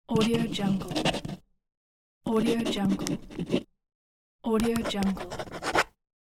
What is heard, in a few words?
signing with pen sounds